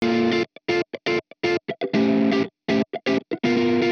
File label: amp wet guitar funk; Morphdrive; Demos for hybrid configurations